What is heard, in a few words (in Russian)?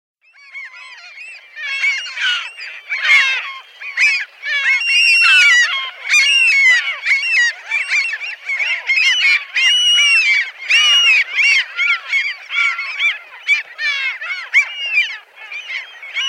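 hohotunya golos, Caspian gull voice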